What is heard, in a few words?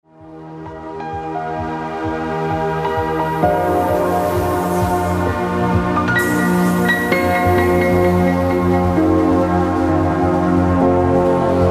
dance music